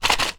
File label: household medicine tablets pack foil box shake; zapsplat